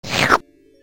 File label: slurp